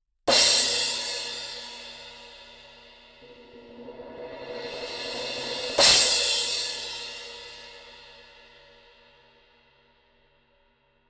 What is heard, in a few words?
Crash